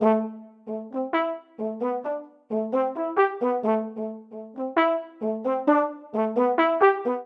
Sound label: Ostinato Flickering i-Vsus A Minor 132 bpm, Brass Quartet; MIDI Clips; Ableton; Spitfire Audio